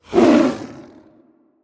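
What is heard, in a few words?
Skeleton Dog Bite Growl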